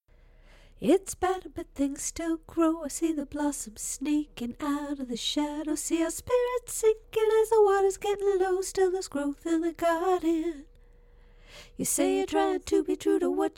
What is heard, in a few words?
Vocal (Melodic); Growth in the Garden